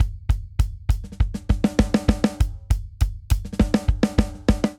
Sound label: Linear Funk Buildup 100 bpm, Drum; 4 4; MIDI Clips; Ableton; Factory Packs; Drum Booth